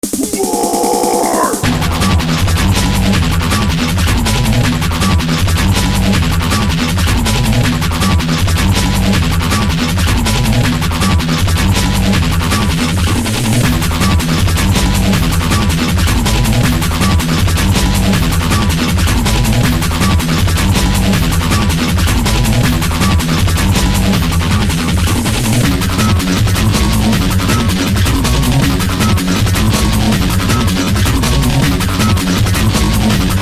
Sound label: Fight; hl1mp; XDM